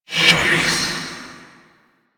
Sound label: Fire Whispers; Weapons; Warframe; Tenno; Grimoire